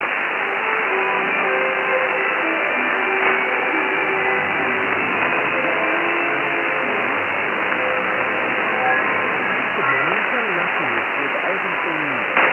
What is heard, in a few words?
1548KHz; ABC